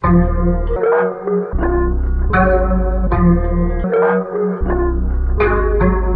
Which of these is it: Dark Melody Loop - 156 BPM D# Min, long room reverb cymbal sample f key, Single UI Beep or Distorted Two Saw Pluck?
Dark Melody Loop - 156 BPM D# Min